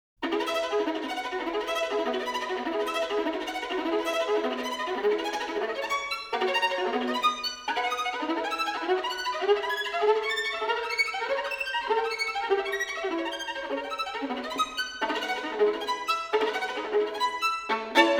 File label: Caprices for solo violin, E Major; Capriccio No. 1; Paganini; Caprices (24)